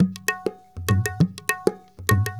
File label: India, Heart of Asia